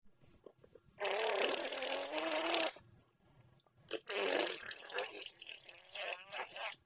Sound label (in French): le fart